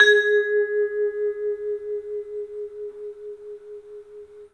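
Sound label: tubular bell; 3D Sound; 3D Keys VOL-1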